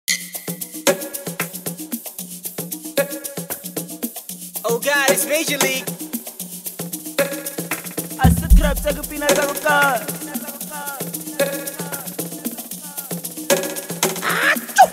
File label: Amapiano Live Balcony Mix